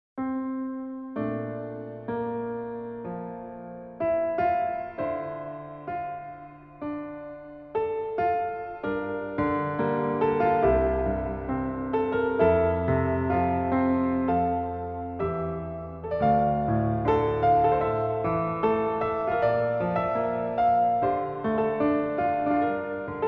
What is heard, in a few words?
piano only ver.; Sword Art Online